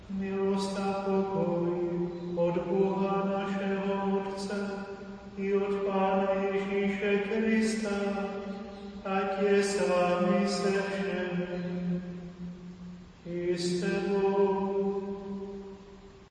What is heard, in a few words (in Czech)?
pozdrav milost naseho choral